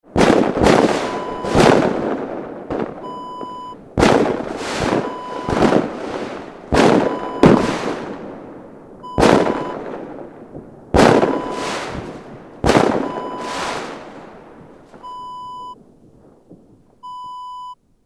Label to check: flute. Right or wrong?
Wrong